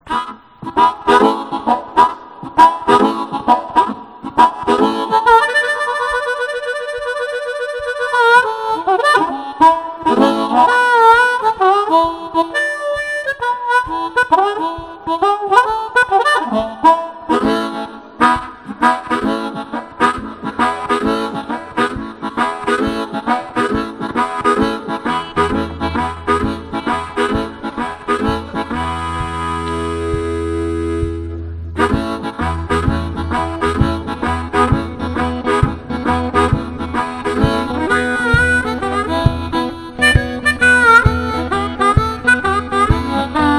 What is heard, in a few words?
Harpintro; Kachelsetzer; Fade Out